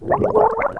gurp; street walker

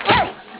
pain; openffa; kennyrim

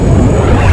hook hit wall

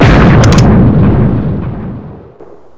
sharps; gunslinger

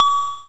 ding

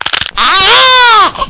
fall; opentdm; babyboom

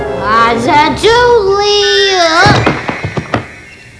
death; vortex

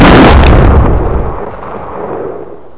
shotgun 1a; gunslinger

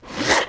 gurp; walker

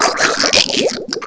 gurp; astro

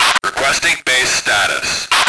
radio; lmctf; andromeda

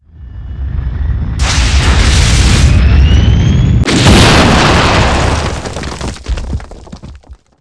flycrash; xatrix; world

